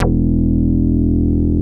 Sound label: MINIMOOG; Greytsounds VOL-1